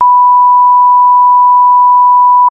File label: sound design beep censor tone